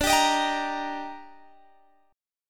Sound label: D sharp Major D, chord; D#/D strummed